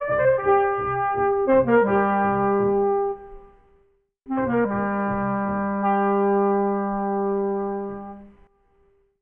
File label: horns playful